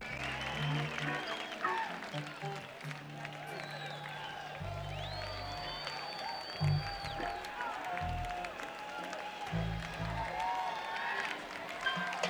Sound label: crowd; Nak100